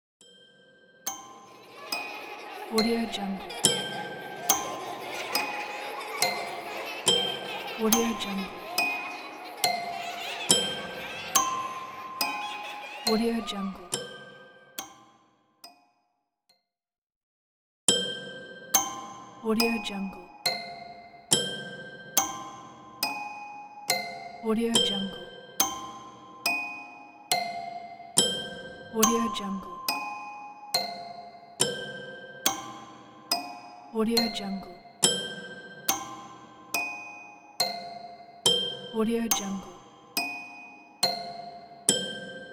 horror toy piano with kids laughing; applehillstudios

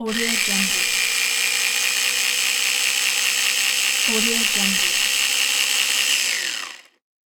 blender

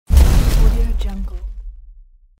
explosion heavy

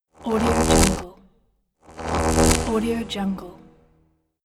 electricity whoosh

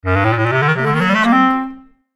notification clarinet very urgent; tsymbal

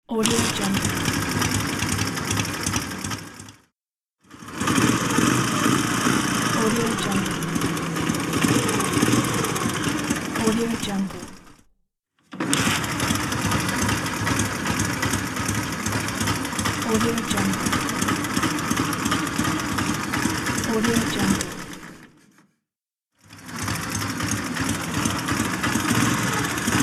motorcycle sounds

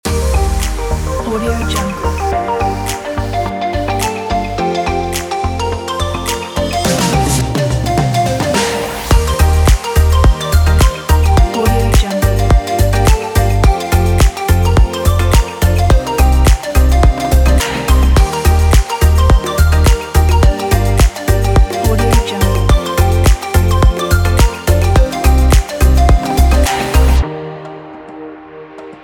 tropical party

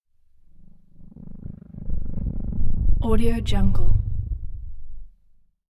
energy pass bys slow synth pulsing buzz hum; prosoundfx

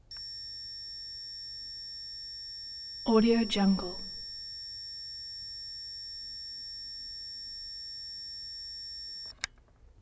olympus om88 slr

